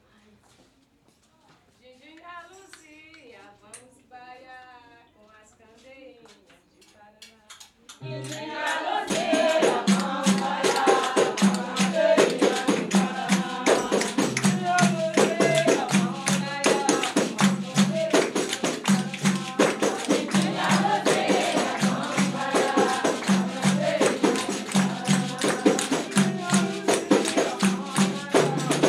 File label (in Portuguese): fanti baiao de princesas dindinha luzia; Baião de Princesas é um ritual especialíssimo e único que acontece apenas uma vez ao ano